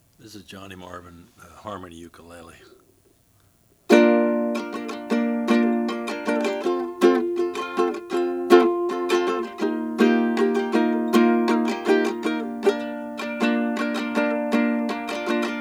harmony mahogany; 1940

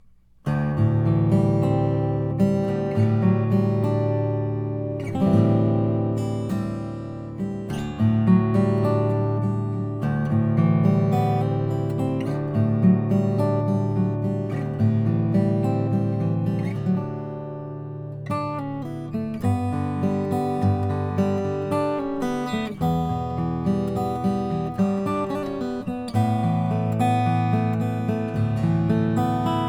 lowden f32c indian rosewood

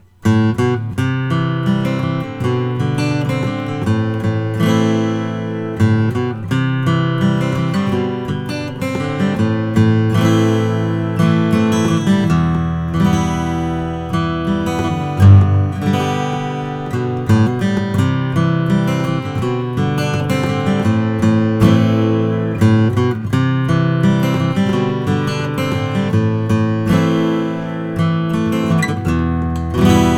santa cruz om custom macassar; natural

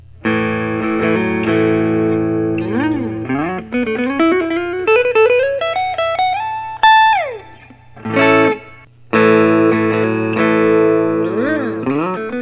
thorn grantura; antique burst

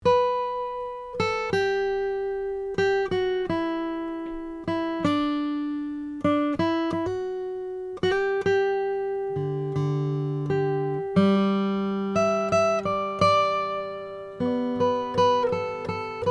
alberico om brazilian rosewood

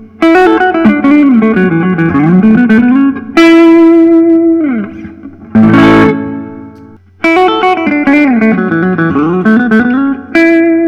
gibson custom; minneso; collectors choice

